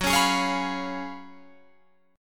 F sharp Major 7th Suspended 2nd Suspended 4th, down, Guitar, Drop D